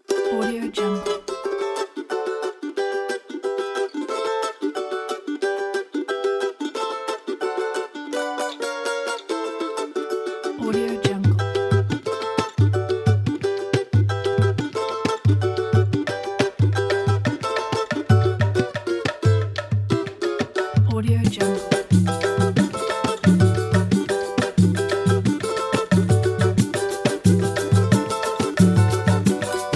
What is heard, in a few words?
groove cavaquinho and percussion